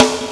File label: snare